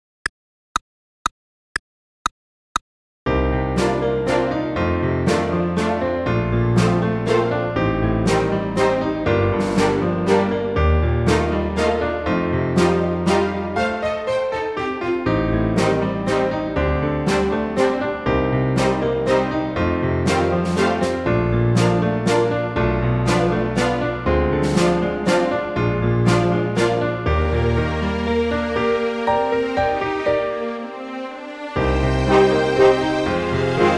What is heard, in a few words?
Trumpet Walzer Playalong; trumpet fun vol 1